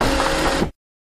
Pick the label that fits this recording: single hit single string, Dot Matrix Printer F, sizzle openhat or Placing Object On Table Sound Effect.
Dot Matrix Printer F